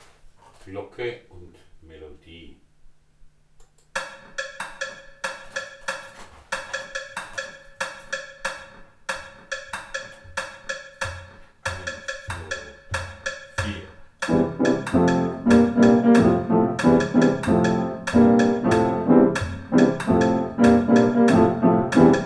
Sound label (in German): Glocke melodie